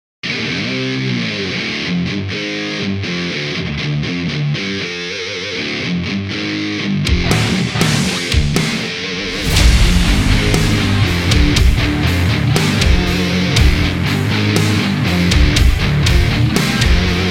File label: Punch